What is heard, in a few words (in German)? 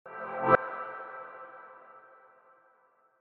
Übergang Signal